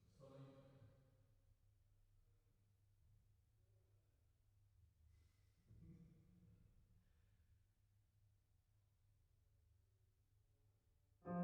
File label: Piano